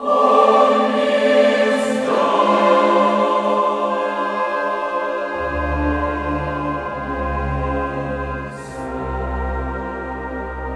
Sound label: Chorus